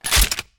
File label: shotgun double reload